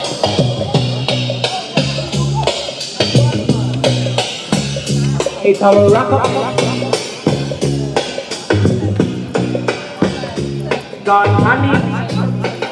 reggae sounds of camden

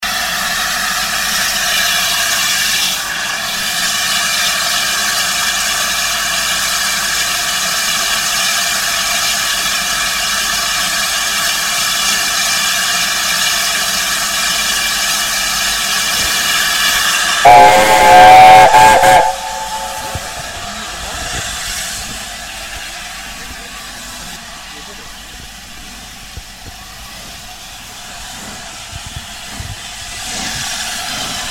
the bittern steam train leaves york station

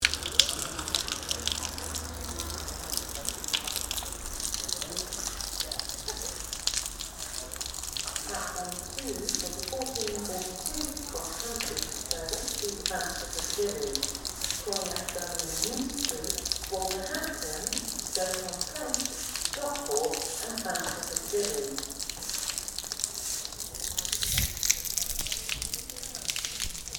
leaky station roof cheltenham

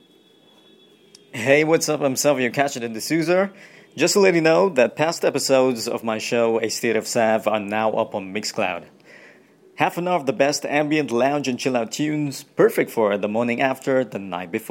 ambient lounge chillout

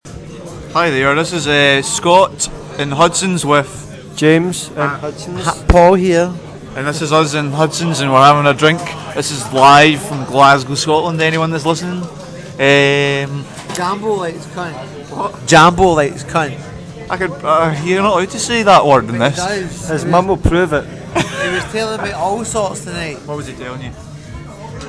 glasgow pub fun

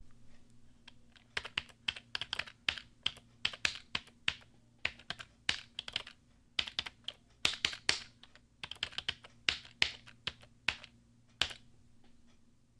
typing

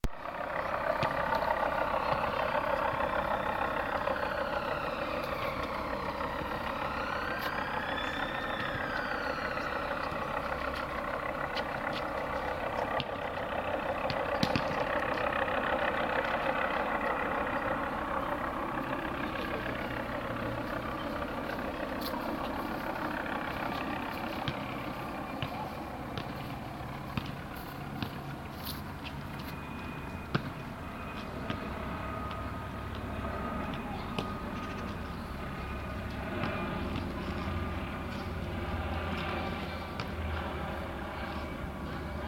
helicopter; gell street park

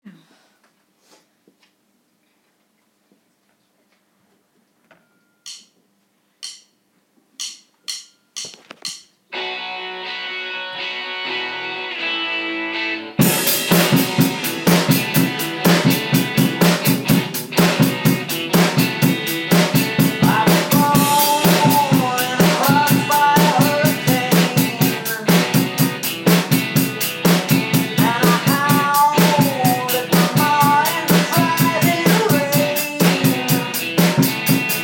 drum solo

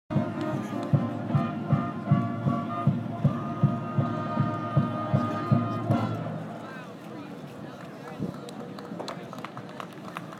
marching band; at fordham rams

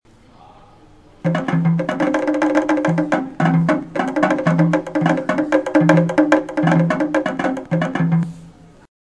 african talking drums

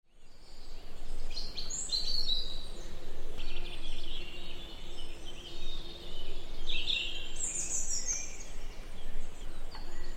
5 minutes of birdsong

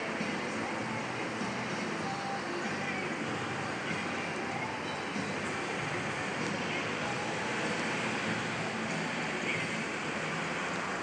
noise from the rank

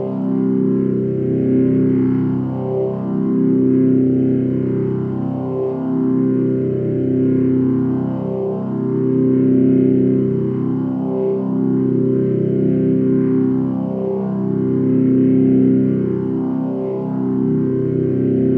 tuba drone

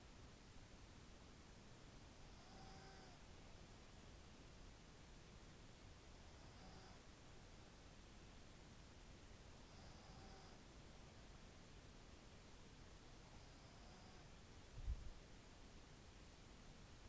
snoring

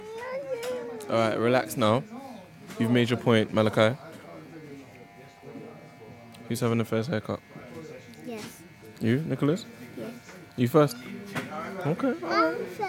barber shop quartet

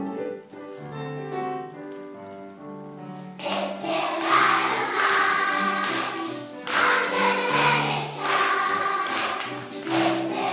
little choirboy

